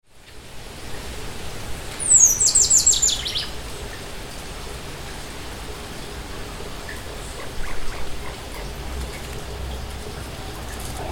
Molothrus bonariensis Chupim, macho

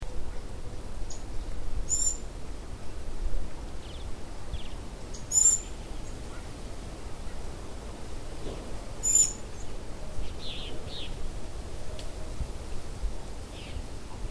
Tangara peruviana Saíra sapucaia, macho

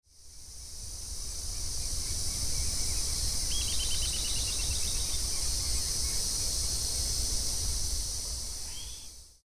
Camptostoma obsoletus Risadinha

